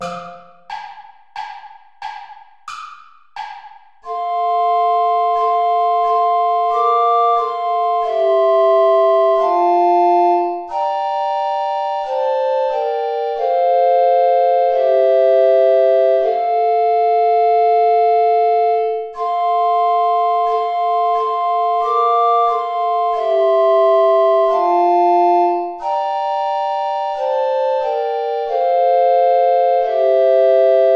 dans une table obscure play along without bass recorder; traditional